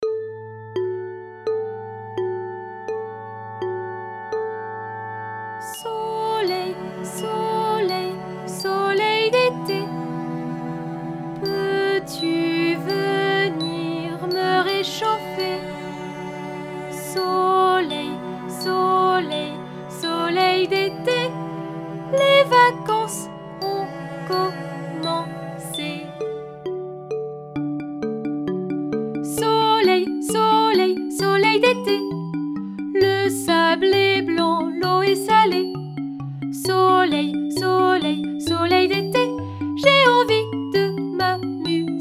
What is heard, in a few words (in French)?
chant